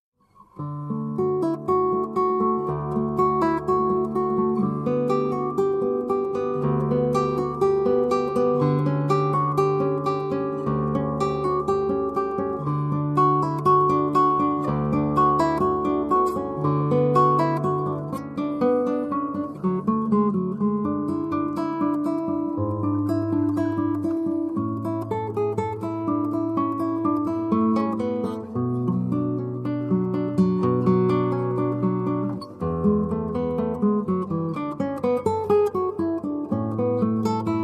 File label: prelude cello suite no 3